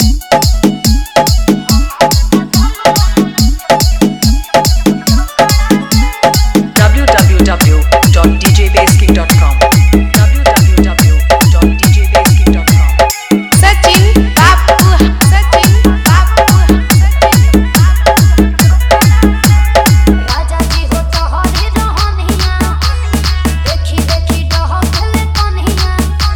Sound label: Hard Vibration Mix